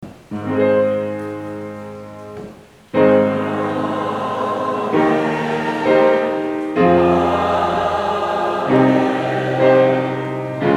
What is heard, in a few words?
Amen